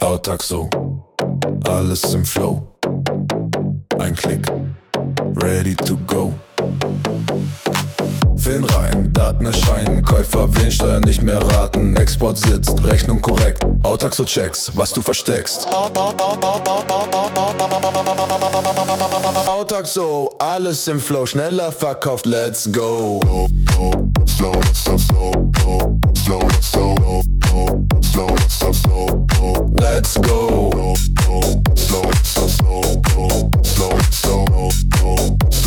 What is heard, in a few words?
techno song